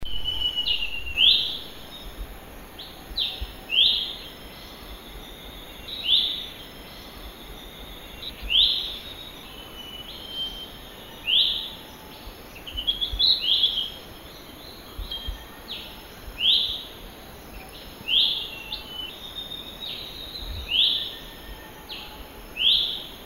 suara panggilan cucak hijau mini di alam liar

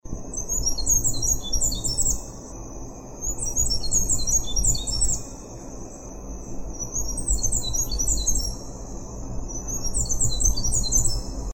suara kacamata dahihitam

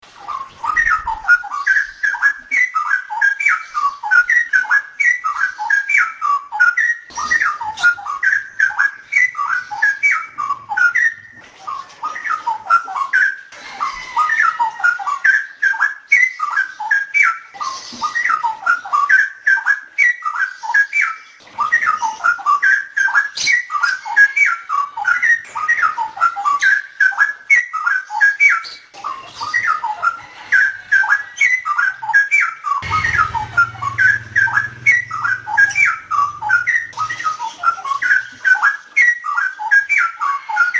suara burung cucakrowo ropel

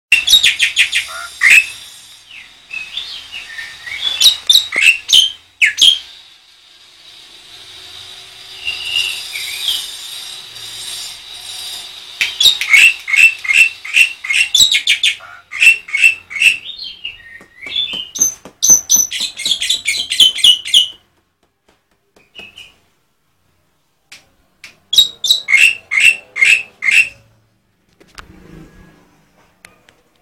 suara burung jalak putih pemaster

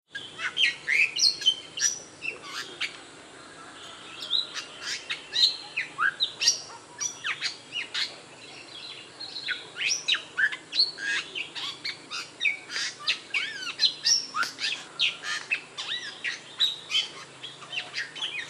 jalak bali

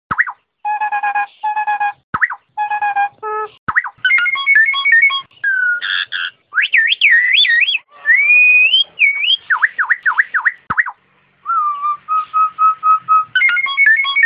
suara jalak nias

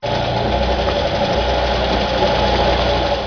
suara mobil

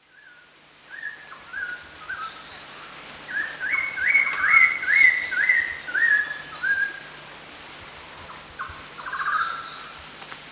poksai genting

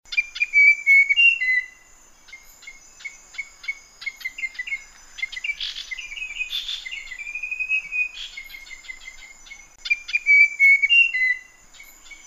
kipasan lurik

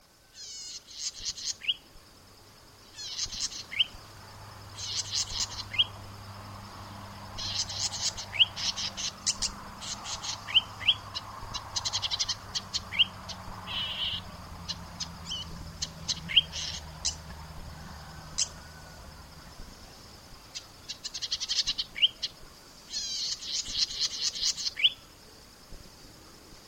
suara cici merah

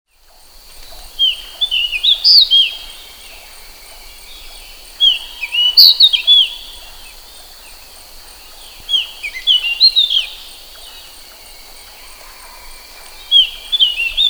suara kicauan burung decu

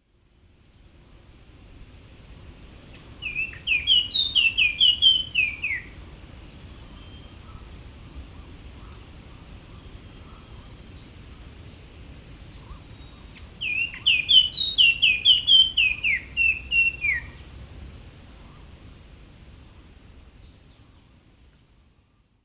suara burung sikatan

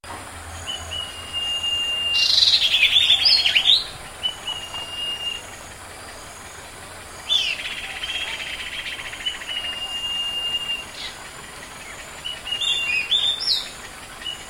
duet sirtu di alam

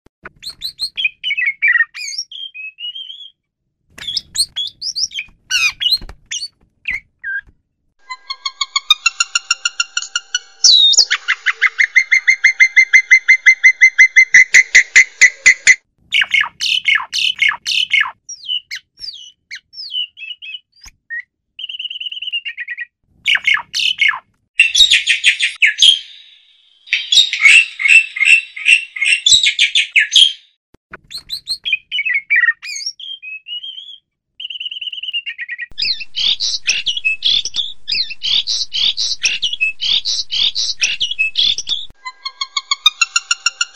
kombinasi jalak nias suren pito

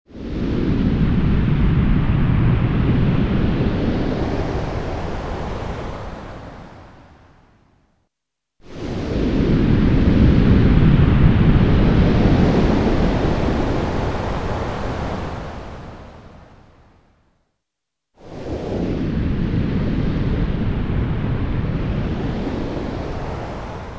hujan dengan gemuruh guntur

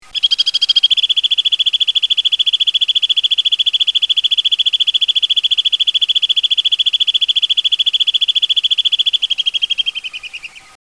walet alpine modifikasi pendek

